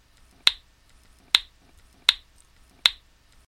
Flash Rollover Fx

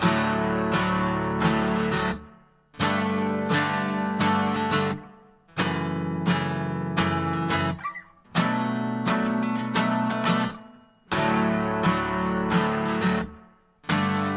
Guitar Loop Acoustic

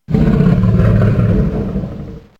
Jaguar Growl

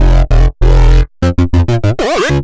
NuSkool Bass

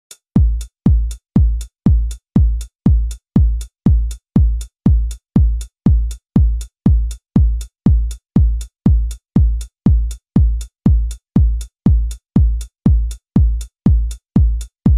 Hard Minimal House

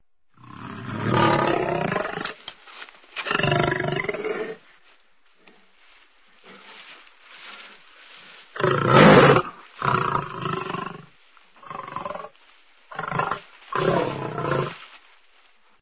Lion Sound

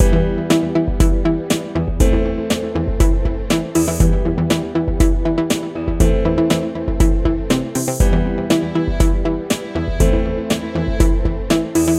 Pop Music Loop

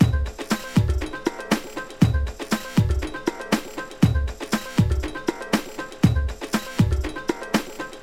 Soul

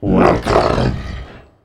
Monster Sounds

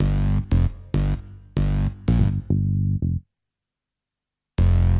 Hip Hop Edge Bass

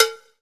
Korg Cowbell

